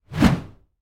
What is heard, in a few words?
Swing; Gameplay